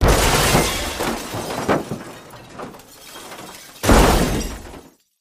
Car Rollover

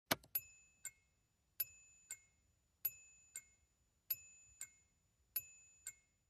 Auto Warning Chimes, auto accessories

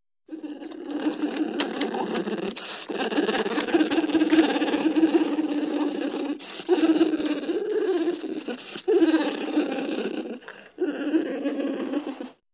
Bear Unknown Type Gru

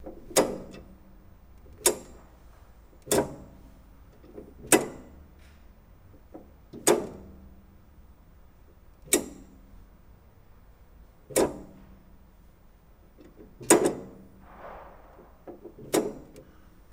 Forklift Gear Shift Levers

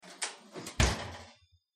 Oven Door Closes; appliances

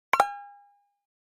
Pop Up Dbl High Guitar, pop up element

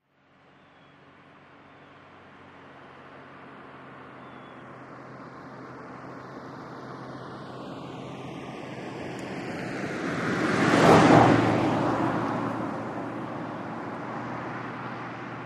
Montero Pass By Med, 1998 mitsubishi montero ext.

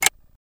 Alarm Clock Tick

